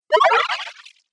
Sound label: Arcade Game Bright Squelchy Jump, retro; Video Game Sound Effects; Retro Inspired; Arcade Game Sound Effect - Retro Inspired Sound Effects - Think Nintendo, Atari, C64